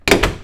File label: vw door close; Beetle door closing